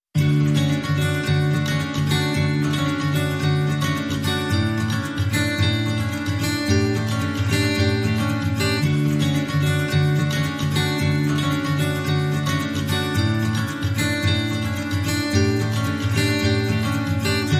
Perc and Acoustic Guitar

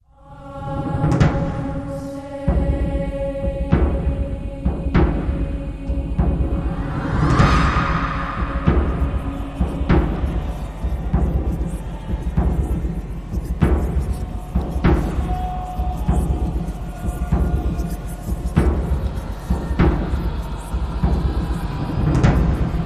Tribal

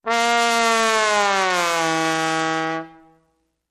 Trombone Medium Gliss Down

